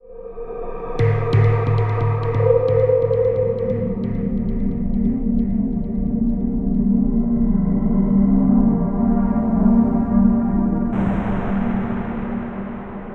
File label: Tubular Stinger; Scourge Outbreak